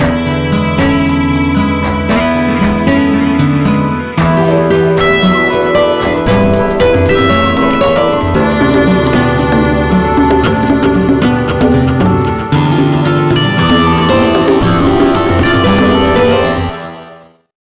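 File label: Watery ringtone; Sony Ericsson; PlusFourSix; Filippa (C901)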